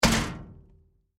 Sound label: trash metal